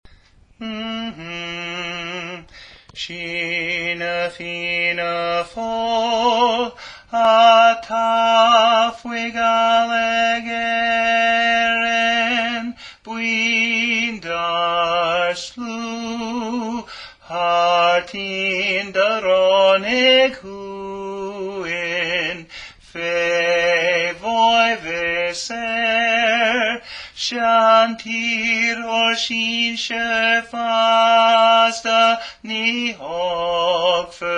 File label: Gaelic Bass Part; Soldier's Song